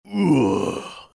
beef grunt big

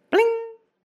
ui bling

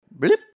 ui blep

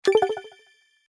menu forward, sfx ui

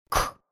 blockstun

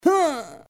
noodle jump

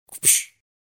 hitstun big

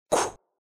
blockstun

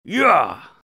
beef yah big

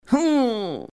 noodle groan

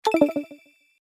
menu back, sfx ui

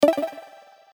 menu neutral

